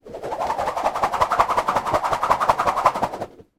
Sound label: foley rope lasso spin air fast; zapsplat